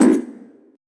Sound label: Oneshot; Sonic Foundry (Sony Creative Software); Esoterik Beatz; Messup